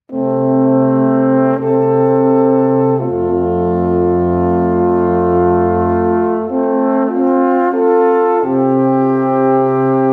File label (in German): Enzian Polka, alphorn